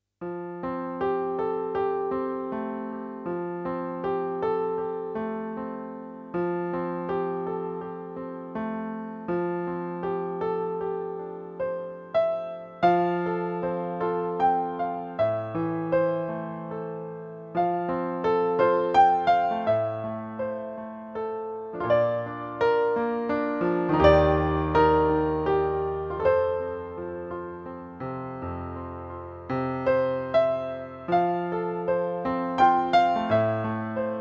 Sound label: Piano Only